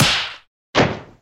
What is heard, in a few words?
bang; Crash Bang Boom